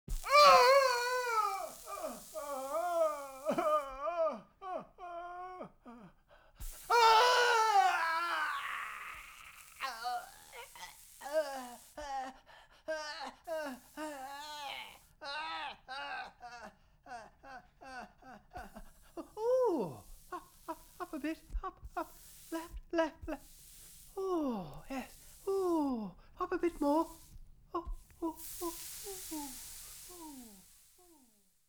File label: Horror, Branding Iron; BBC Sound Effects Library